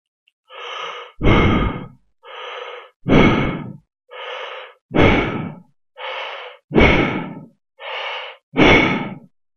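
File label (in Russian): звук дыхания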